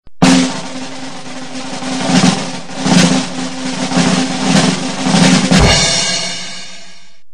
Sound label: Circus Roll